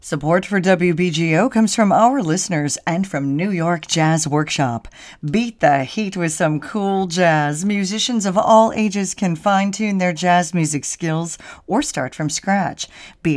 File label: Jazz Workshop Spot